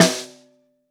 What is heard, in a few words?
SN2 Bottom, Snare; Ableton Foundation FreePacks; Snare Designer